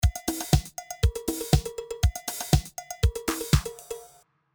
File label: drums